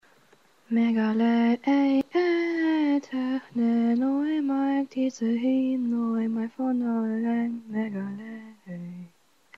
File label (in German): hymne Altgriechisch